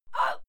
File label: female fight scream short soft; schreie; menschen